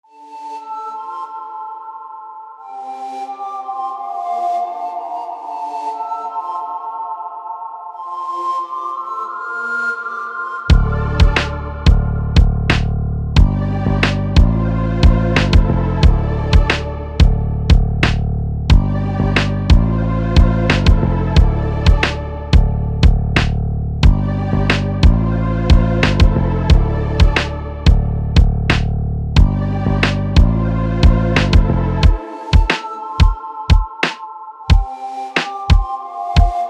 синтвейв бит

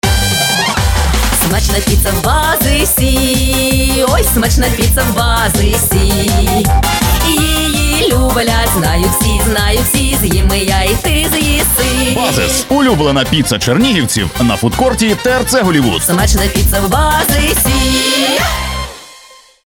джингл; Базис

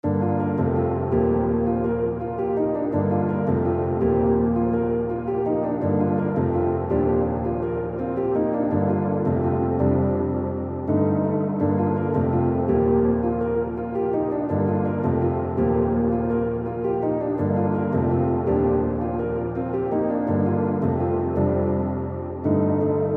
пианинка

